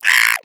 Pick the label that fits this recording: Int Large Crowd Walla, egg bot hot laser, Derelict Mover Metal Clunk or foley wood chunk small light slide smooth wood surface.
egg bot hot laser